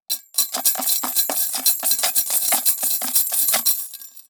Foley Metal Rings Glass Bowl Plastic Lid Rattles

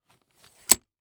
Household Handle Luggage Handle Slide Down

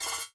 Multimedia Internet CD-Rom Flash Wipe

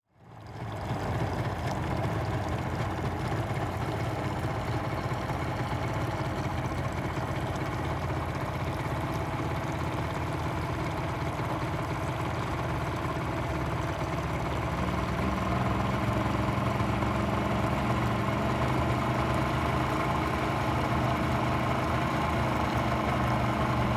Transportation Boat Engine Idle At Dock

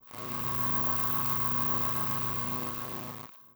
Sci-Fi Electronic Electrical Surge; Science Fiction

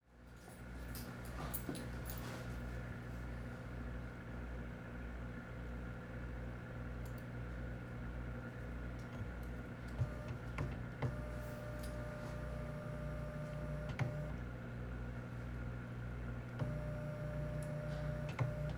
Ambience Office Scanner; AdobeCC

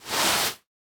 Foley Sand Movement Short

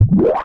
Multimedia Internet CD-Rom Flash Pop Up

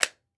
Weapon Gun Pistol 9mm Glock Clip Lock; AdobeCC

